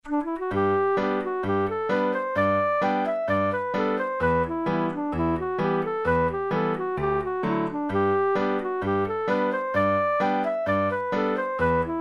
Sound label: Temperance Reel 65bpm